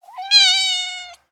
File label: Kitten Meow; SES Animals SFX